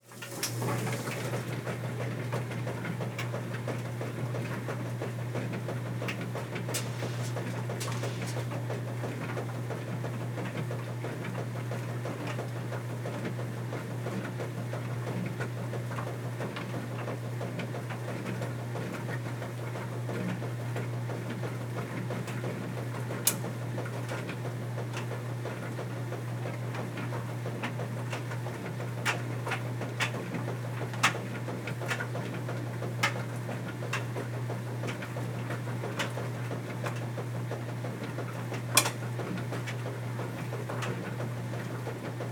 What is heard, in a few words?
Washer Runs, Dryer Runs same time; Fairlight Sound Lib